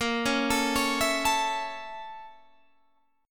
A sharp Minor Major 7th Flat 5th, down, Guitar, Standard; arpeggiated; JGuitar